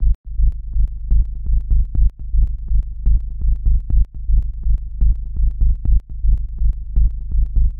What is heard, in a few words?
tech house bass - g sharp m - 123; instruments; loops